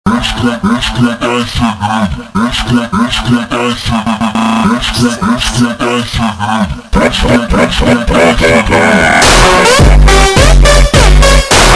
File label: Funk super Slowed